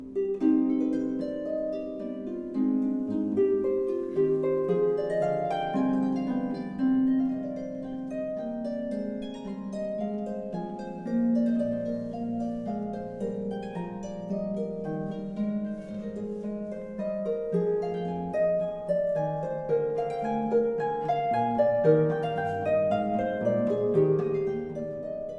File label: Allemande- French Suite No.2; BWV 813